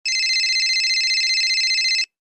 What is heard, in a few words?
flip phone rings